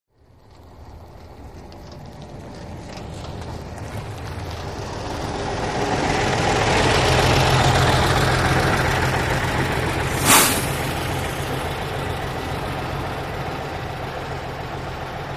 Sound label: Bus By Coach Slow Past